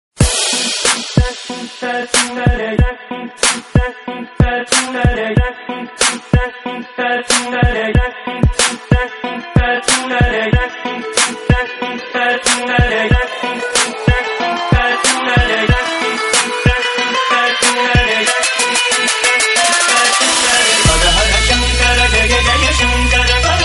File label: Turkish Trap